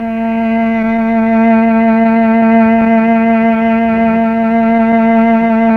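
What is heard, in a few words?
strings, Mellotron combo slow; Roland LCDP09 Keys of the 60s and 70s 1; CMB Melo.Combo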